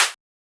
hat dusty, Closed Hat, Drum One Shots; SD MIDNIGHT Sample Pack; Slate

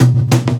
tom skip; Drums; One Shots; Slate; AURORA Sample Pack

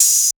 hat wide, Open Hat; Hip-Hop; Drum One Shots; SD CAICOS Hip-Hop Sample Pack; Slate

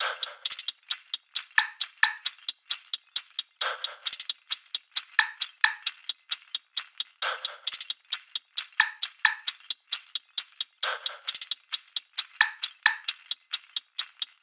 tops 133, Drum Sample Pack, Top Loops; SD SEISMIC; Slate

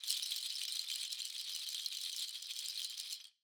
rattles Roll, Raw Perc One Shots

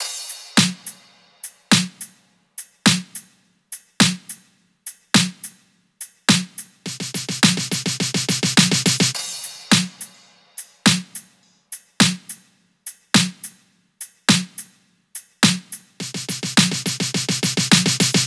top 105, Drum Loops; Slate; SD MIDNIGHT Sample Pack; Cherokee

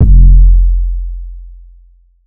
808 oneshot Cape F; Slate Digital BASSHOLE Sample Pack

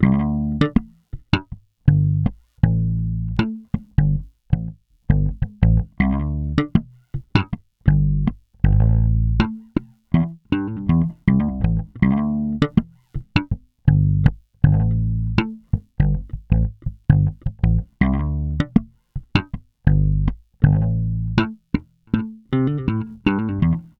Bass loop 80 Am, Electric Bass; Loops; SwordTail; Slate Digital BASSHOLE Sample Pack

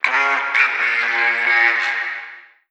phrase Bbm, Vocal

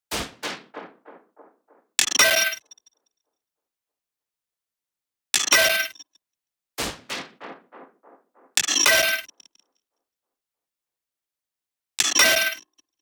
perc 144; Drum Sample Pack; Loops; SD SEISMIC; Slate

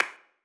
clap one shot; Pop; Drums; Slate; SD PASADENA Pop Sample Pack

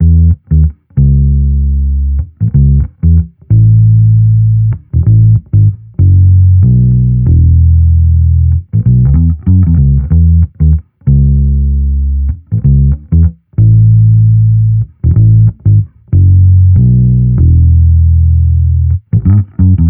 Amped loop 95 E, Electric Bass Loops; Slate Digital BASSHOLE Sample Pack

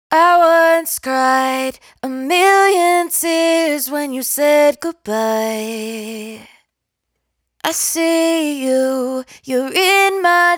hook 128 Am, Dry Vocals; Raw Vocals; Million Tears; Slate Digital Omnivox Vocal Sample Pack

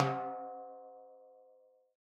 timbales Low Edge; Raw Perc One Shots; Slate; SD SEISMIC Drum Sample Pack

